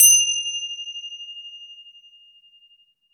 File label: finger cymbal E; FINGER CYMBAL 2